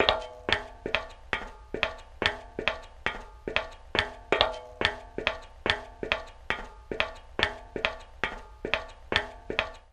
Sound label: Footsteps Female